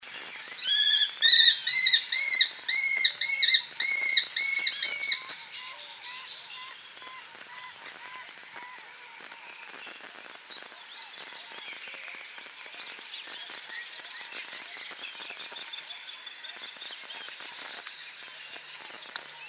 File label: aplomado falcon